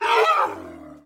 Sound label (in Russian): rev monstra odnogo podbitogo